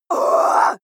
female rising shout aggressive; schreie; menschen

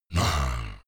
beast growl low, comedy cartoon; kreaturen; soundpool

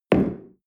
espresso place tablecloth

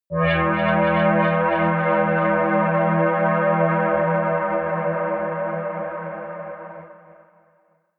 atmospheric waves, drones

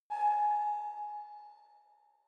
sci-fi facility ai awake damaged; maschinen; signale; soundpool

